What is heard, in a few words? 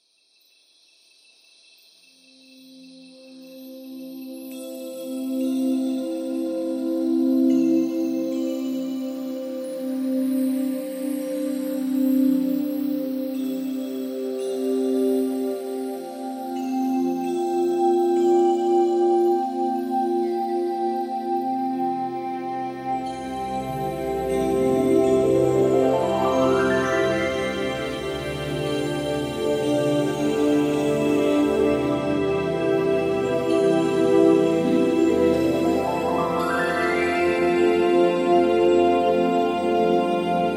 Sparkling mist; Samsung Galaxy S4